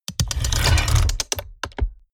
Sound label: mechanics medium trail